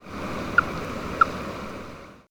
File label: Rana aurora